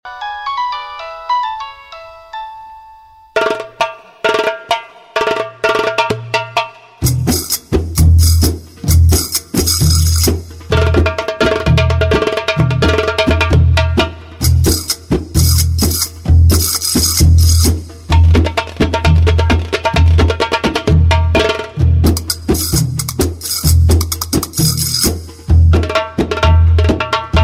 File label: Belly Dance; Nokia